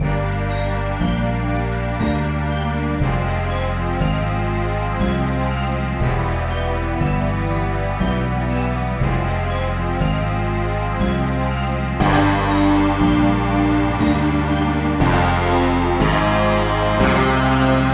Dungeon; RPG Maker; Anime-Vidgames

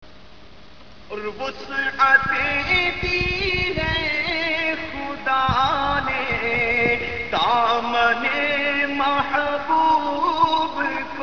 naat

